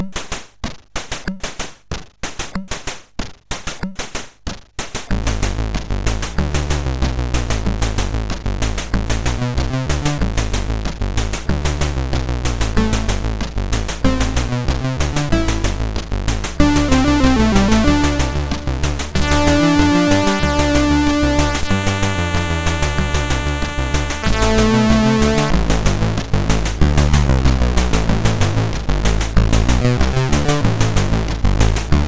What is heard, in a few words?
Bad Drums, MOS6581; MOS6581R4